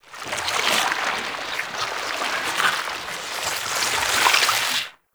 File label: Wrist Gun Alt Charge Up Tentacles; Weapons; Warframe; Tenno